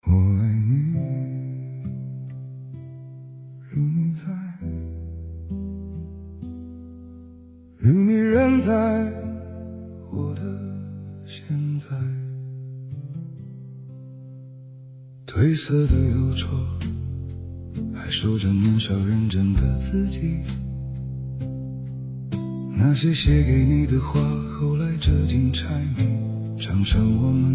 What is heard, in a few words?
music